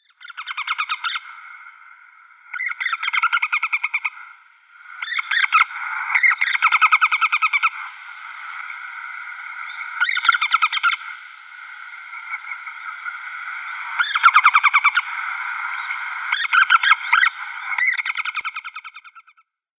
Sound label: Pallid Harrier female